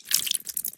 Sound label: Blood Splash